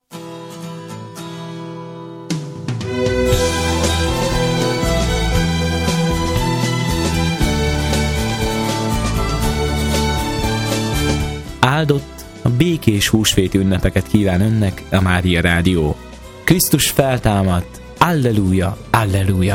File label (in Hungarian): Husveti Jingle; Husveti Szignalok